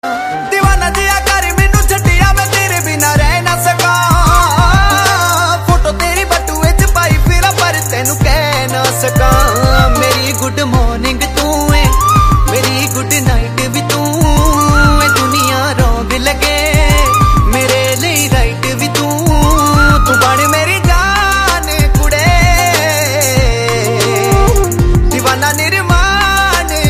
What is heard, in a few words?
new song ringtone; movie luka chuppi